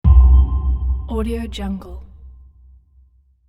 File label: tonal sonar bass impact